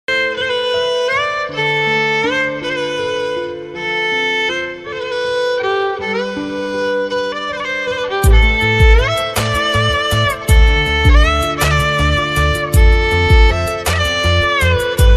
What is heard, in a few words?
instrumental sad love ringtone